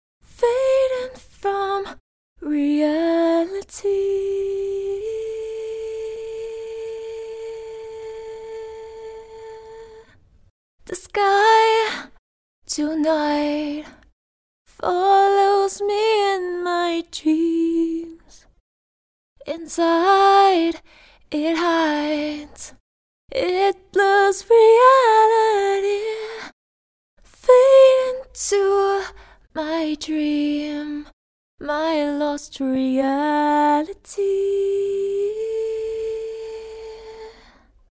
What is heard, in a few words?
Lead Vox